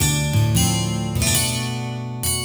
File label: 12 String Guitar; Sonic Foundry (Sony Creative Software); Mac Money; Guitars